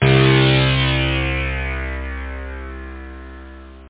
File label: analog syn; OctaMED SoundStudio V1; jazzies samples